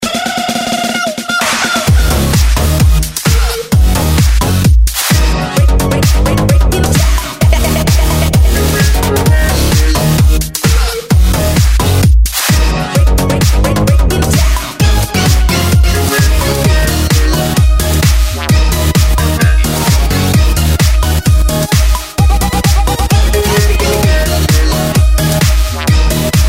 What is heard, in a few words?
Electro